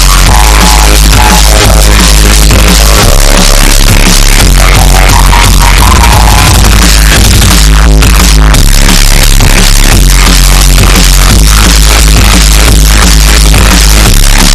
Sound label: hardcore flute